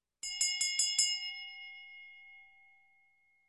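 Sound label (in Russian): колокольчик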